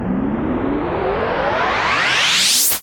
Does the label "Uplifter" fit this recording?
Yes